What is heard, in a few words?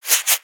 Owl Scratching; creatures organic; Owl Backpack; aronwk collection; ndaudio